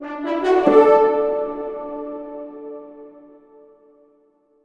reward small; Armello The Bandit Clan

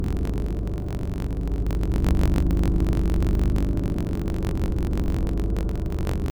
In Water Down Hole

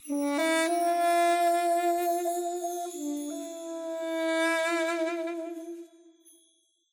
Duduk; Temple Rnd; Tintin Reporter - Cigars of the Pharaoh

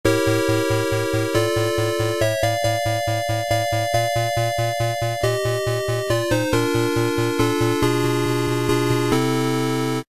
gameover; Shadow Archery; wii u gamerip 2018

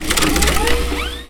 crawl; mangle; Five Nights at Ronald's

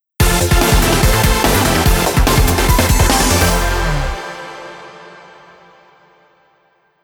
Victory Jingle; Rumble Roses XX

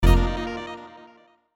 Race Stop; Summer Catchers

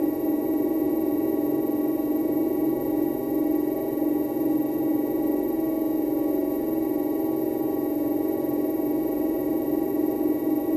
Moving Platform Loop

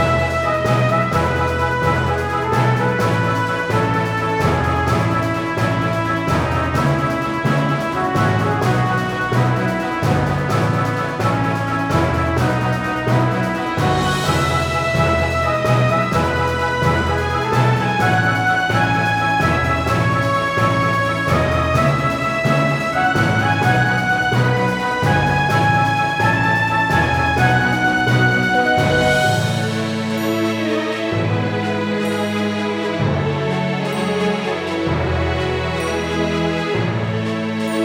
Knight Celebration Loop; Eternal Radiance

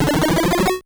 cookie pickup

